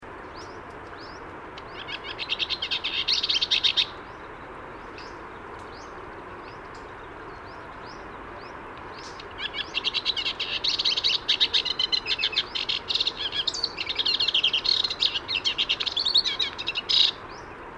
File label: Hippolais rama, Liwa; oman; Linnut